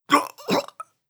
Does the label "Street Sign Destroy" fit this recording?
No